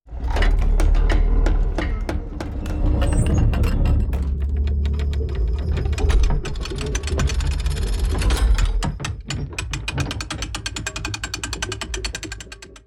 turnstile start; fallguys

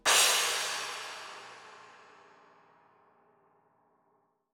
percussion end; fallguys

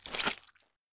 jump slippery; fallguys

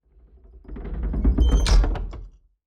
turnstile stop; fallguys

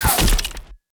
rhino end attack; fallguys

